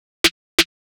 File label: Drum Loop - 175 BPM Snare, Hip Hop; Cymatics; Telefono